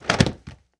Body Fall Medium, Hand To Hand Combat; Sony Pictures Sound Effects Series

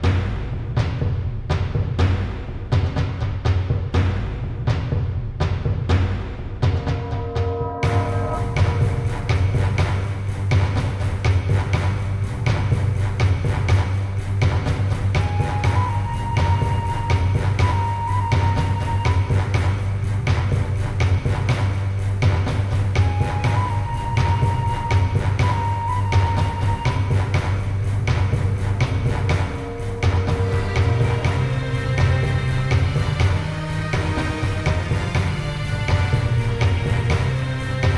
music

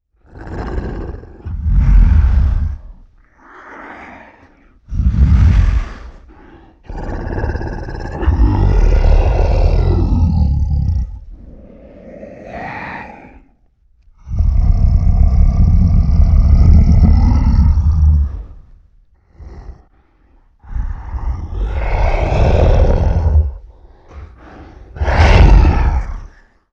Snarling & Breathing, Vocals - Monster; Sony Pictures Sound Effects Series

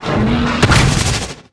attack

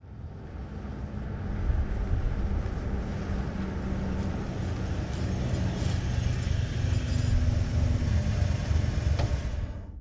Ford V8 Truck Ext In Slow Off; Sony Pictures Sound Effects Series; Period Vehicles

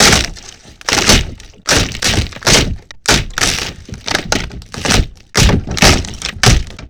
Wood Destruction; Sony Pictures Sound Effects Series; Impacts & Destruction Sweeteners

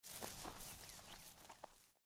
Steps; Microsoft Studios; Age of Empires III - Complete Collection; cinematics

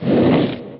Envenum; RYL 2

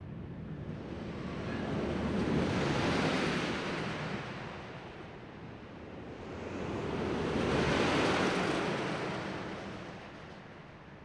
Roller Coaster Bys; Sony Pictures Sound Effects Series

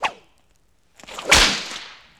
Whip Crack; Weapons & Explosions; Sony Pictures Sound Effects Series

